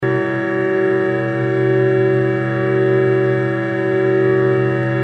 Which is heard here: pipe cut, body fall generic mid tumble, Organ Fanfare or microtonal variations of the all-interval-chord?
microtonal variations of the all-interval-chord